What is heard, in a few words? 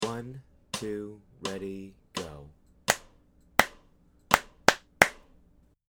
rhythm practice